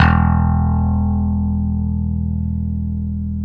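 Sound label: Electric Bass Hammer, Roland; Club-50 - Foundations Roland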